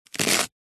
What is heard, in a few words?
celery raw cut knife single